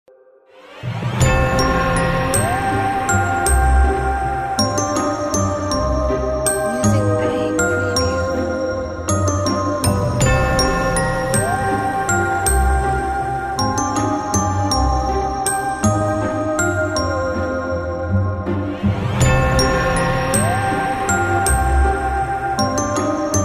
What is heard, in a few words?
Fairy Lullaby